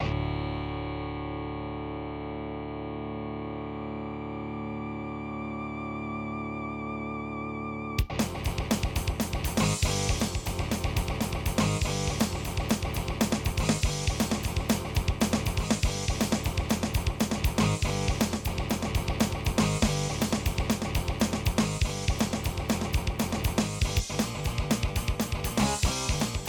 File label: korg ai20; soundcard test; Doom